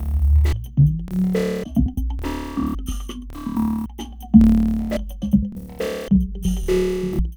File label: FX BEAT; Big Beat Drumloops; FX BEATS; AKAI